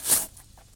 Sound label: planting sound; plants vs zombies